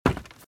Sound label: Footsteps - Wood - Creaky - Run - Right